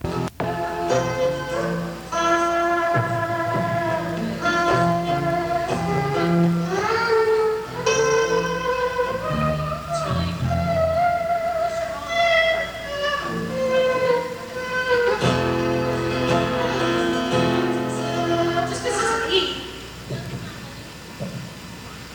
soundcheck

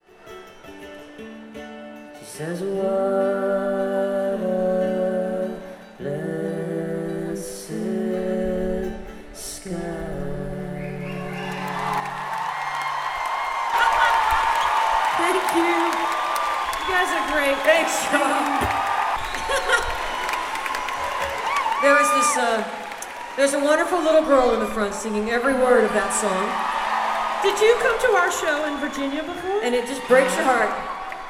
little girl singing, crowd talk

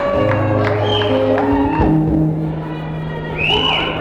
crowd

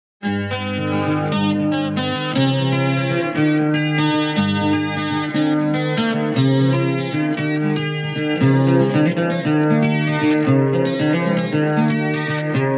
instrumental

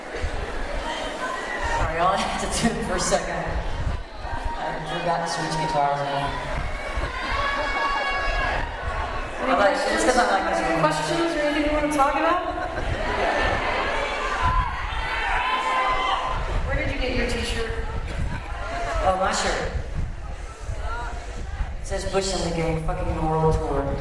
bush talk tuning

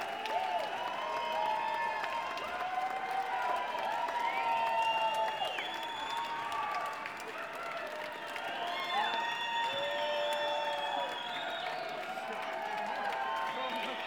crowd noise

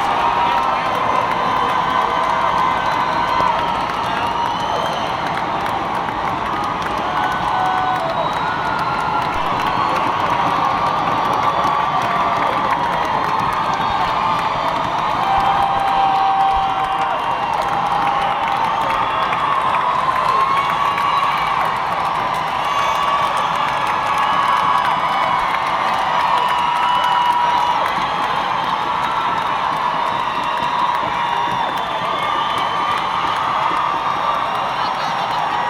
applause

